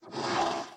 骷髅马嘶鸣声

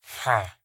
村民否定声

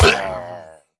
掠夺者死亡声

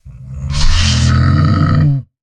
骆驼站起声

